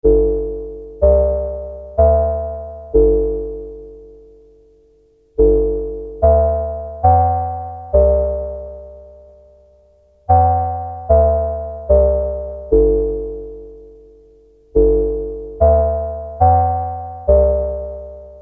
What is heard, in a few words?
Ring Mod